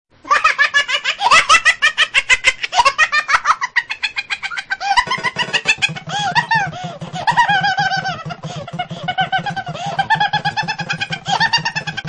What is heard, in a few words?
Ahahahahahah; msn sounds